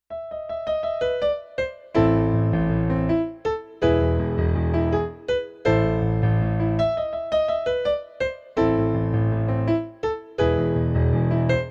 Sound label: early intermediate piano solos; piano remixes classics vol 1